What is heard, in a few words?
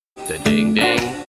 Da Ding Ding